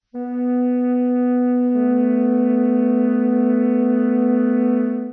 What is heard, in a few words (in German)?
flöte; Intervalle, die man durch Potenzen von 2 und 3 darstellen kann; h1 a1